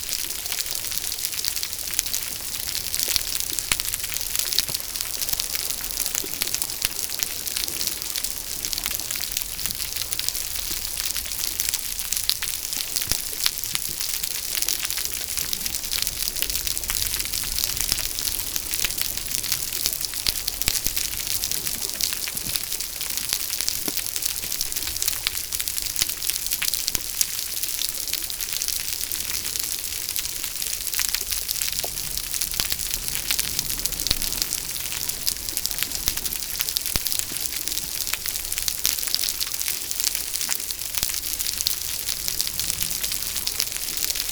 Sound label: tara reef